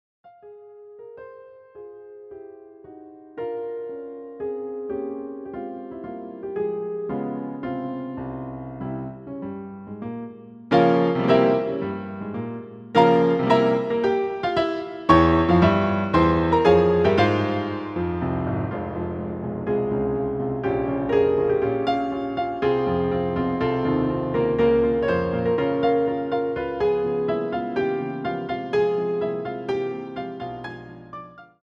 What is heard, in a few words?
Piano Concerto; No 2 Opus 21 first mov. Maestoso; Chopin